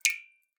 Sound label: Drips